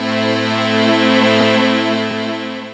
DR. LOOP; X-Static Goldmine 3; CHORDS

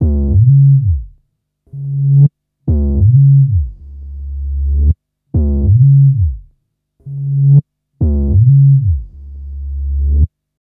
Bad Bass, Drum & Bass; Fluid Dynamics

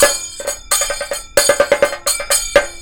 KATAK, Indian Traditions

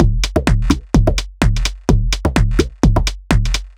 A 127bpm, House Drumloops; Zero-G House Fabrik Drumloops; ZG Drumloops

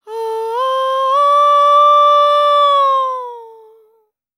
World Voices, INDIA; ILIO; Vocal Planet

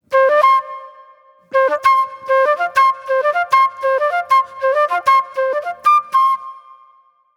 Acid Jazz Flute

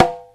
CONGA SET; OMI - Universe of Sounds

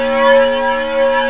DISCORDES

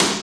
SNR, Snares; Roland - Rhythm Section; Snares 6; Sn Modules 6

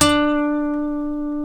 E, funk guitars; Spectrasonics; Hans Zimmer Guitars VOL-1; TAKA FUNK

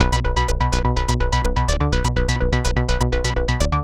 Moog arp; Sonic Foundry (Sony Creative Software); Underground UK House & Electro; Bass

